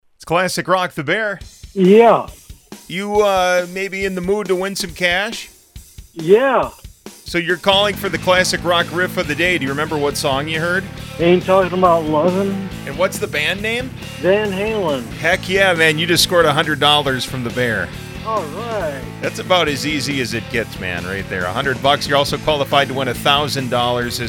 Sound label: riff